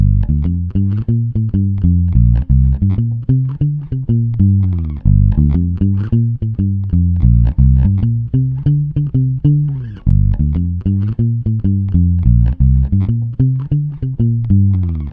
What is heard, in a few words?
thump